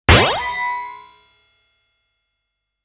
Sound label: Insert Coin